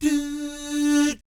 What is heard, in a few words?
DOO SFZ, Jazz